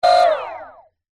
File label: science fiction robot movement short burst; zapsplat